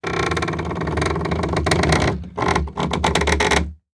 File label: Horror Scary Gate Wooden Gate Rattle Squeak